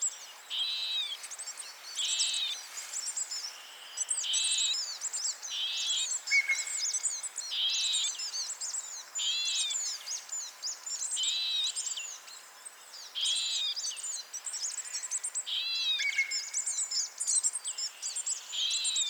Kashmir Nuthatch Sitta cashmirensis, kashmiri nuthatch call